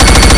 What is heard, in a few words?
alt fire; Unreal tournament